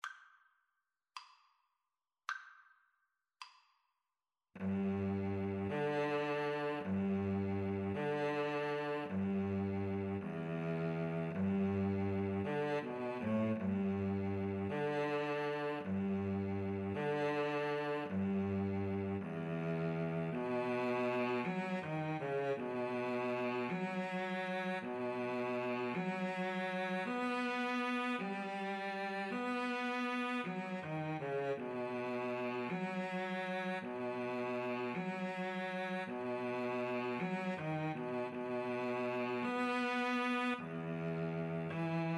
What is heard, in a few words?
grieg morning, Flute Cello Duet